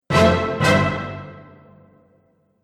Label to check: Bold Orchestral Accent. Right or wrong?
Right